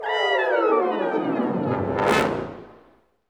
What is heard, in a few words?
BRS RIP, LOW BRASS; Roland - LA Composer vol. 1